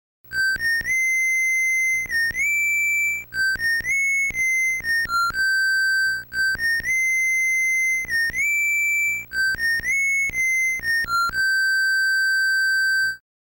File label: New Character; Capcom Music Generation